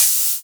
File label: Open HH, Cymbals